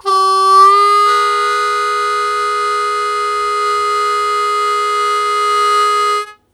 Blues Chord Attack C#1, Harmonica; Zero G - Harmonica

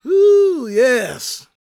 GOSP MALE, SOLO; Vocal Planet Vol1 Gospel

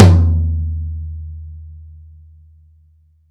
TOM D, Drum Dry; East Collexion; Drum 1

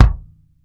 COMP, KICK DRUM; Sampleheads; New York City Drumworks VOL-1

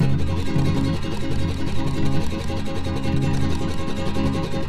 D M, Guitars; Spectrasonics; Hans Zimmer Guitars VOL-2; FLAM TREM CH

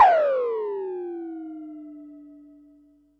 Perc, Percussion and FX; Pearl SC-40

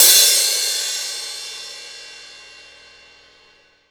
Crash Cymbal, 16-17 INCH CRASH; AKAI S6000 CD-ROM - Volume 3

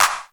D70 CLAPS, D70 DRUMS; Crypton - DrumBase5000

